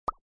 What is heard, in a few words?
pop game organic cartoon